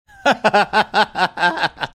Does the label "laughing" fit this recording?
Yes